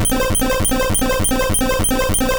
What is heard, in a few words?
Leech Seed; Pokemon; Gen 2; attack moves; GSC